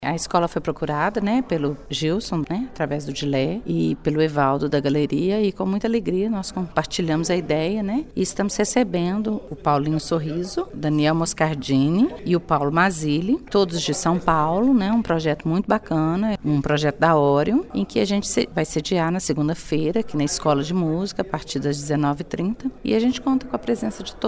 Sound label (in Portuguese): bateria